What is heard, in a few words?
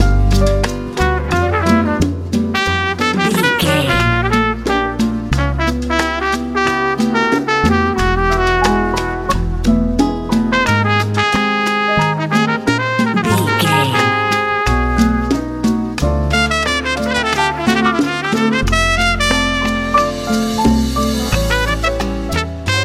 cuban latin